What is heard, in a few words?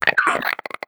idle chirp, headcrab, creatures; headcrab classic; vox